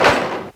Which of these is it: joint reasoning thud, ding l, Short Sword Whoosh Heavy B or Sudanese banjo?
joint reasoning thud